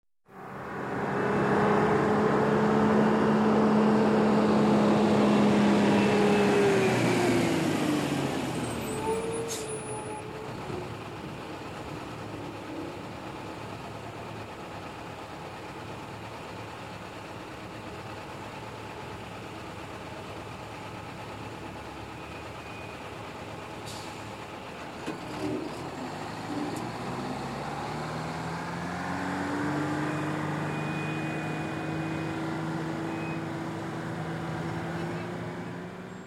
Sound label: Truck Approaching and Stopped